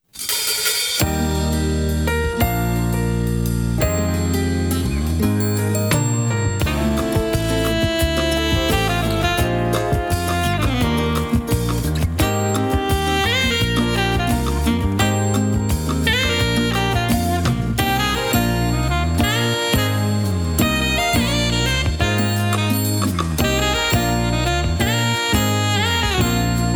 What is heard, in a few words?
jazz